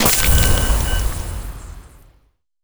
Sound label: voidspawn missile; Stellaris; distant stars